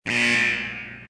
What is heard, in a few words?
alarm buzzer quiet; WoW Wotlk; GTFO